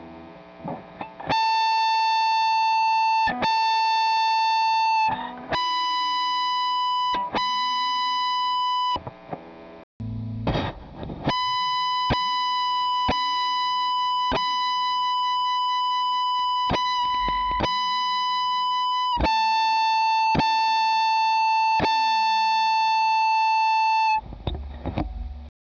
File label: aliasing